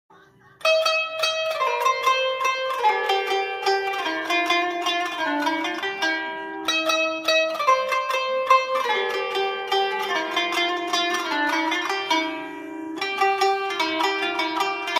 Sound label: qanoon; ساز قانون